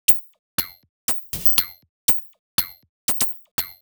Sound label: Odd Glitch, 120 BPM, Percussion; Sonic Foundry (Sony Creative Software); Underground UK House & Electro